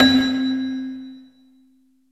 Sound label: CELESTA, JV-1080; Syntec; Wall of Sounds VOL-2; ORCHESTR-EXP